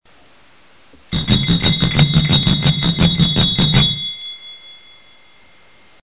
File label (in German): Alarmanlage; Minutengeschichten; Gauner